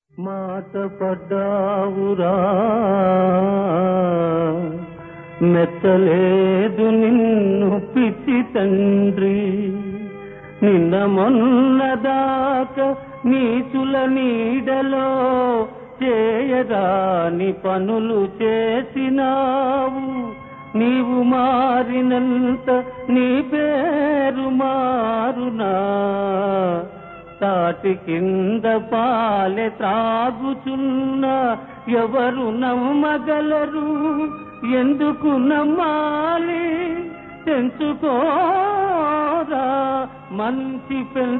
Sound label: Padyam; Namminabantu(1960)